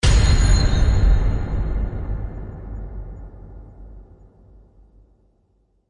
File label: Hit Big Scared To Death; Brand X Music; Brand X Music Catalogue - Editor's Essentials